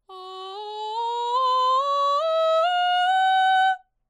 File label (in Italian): VOX Scala O